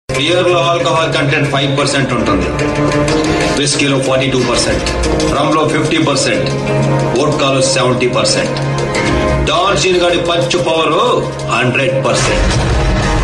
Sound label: Powerful Metal